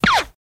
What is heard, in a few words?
Machine Gun Silenced, silenced bursts